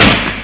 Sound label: ar shot; matrixhl; weapons